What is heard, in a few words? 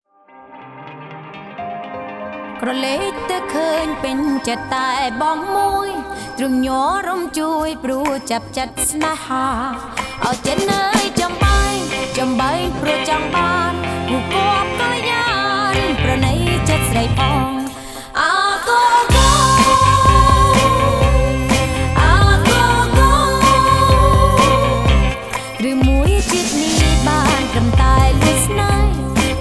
agogo remix